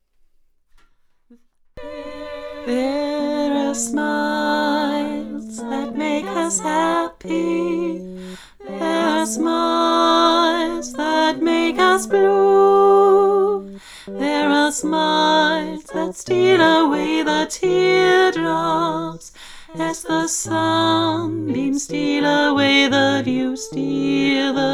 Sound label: Alt lauter